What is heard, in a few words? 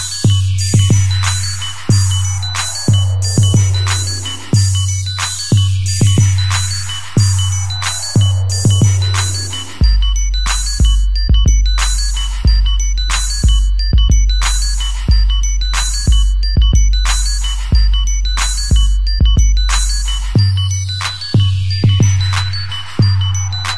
Hiphop Beat